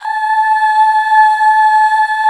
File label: UUHH, Back Uuh Fem; Sound & Vision - Gigapack II CD 1